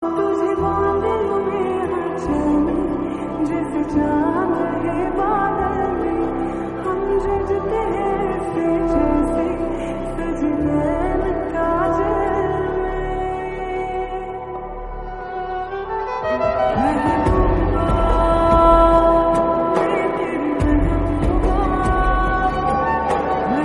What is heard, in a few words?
slowed reverb